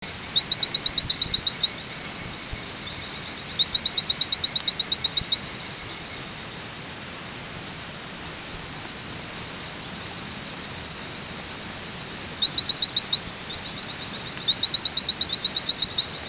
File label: Colostethus pratti, dart frog